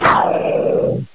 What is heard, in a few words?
Lion Death; Playable Tutorial Level; TR1